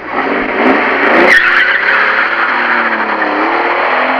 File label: screech off; svencoop